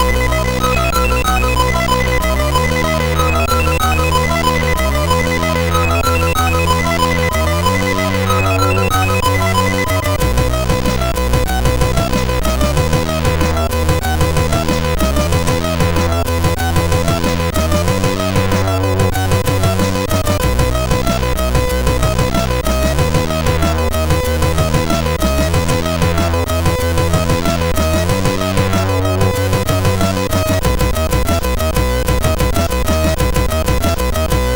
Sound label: Turbo Tune, sid MOS6581R3